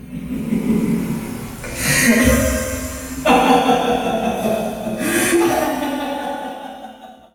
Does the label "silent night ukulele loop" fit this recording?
No